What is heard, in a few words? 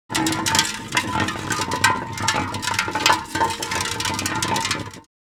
Empty paint can rolling on the ground